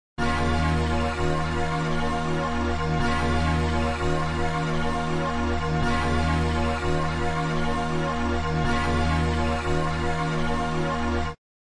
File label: big pretty air pad; Pads